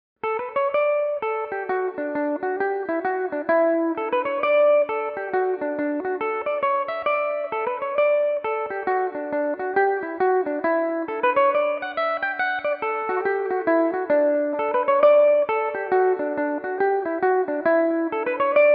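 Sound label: D major belmuse, irish mandolin; Belmuse EM-190 electric mandolin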